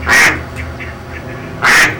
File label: duck sound